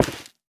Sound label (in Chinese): 菌柄破坏声